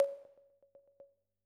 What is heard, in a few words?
Perc, drum